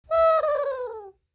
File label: hihi